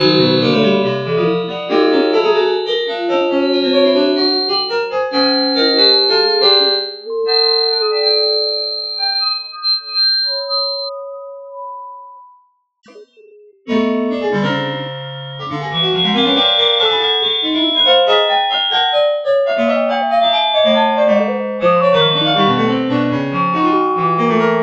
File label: 12 tone